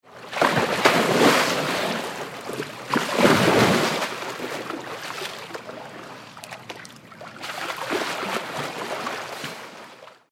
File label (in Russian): zvuk tonushego cheloveka